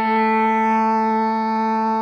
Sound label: cello